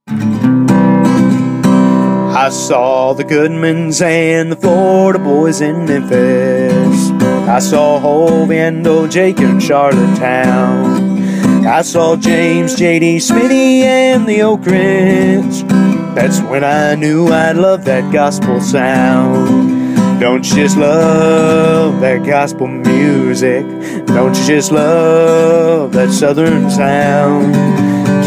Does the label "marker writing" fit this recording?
No